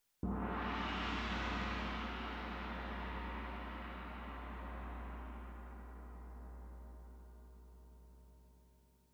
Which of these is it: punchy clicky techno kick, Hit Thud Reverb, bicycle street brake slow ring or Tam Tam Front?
Tam Tam Front